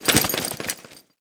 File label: suit, footsteps